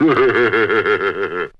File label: laugh scratch; Voice; ooh ahh